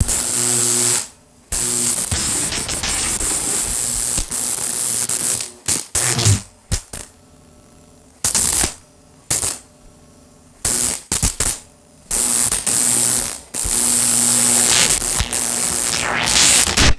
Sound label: close-up electrical arcing and buzzing zaps; electricity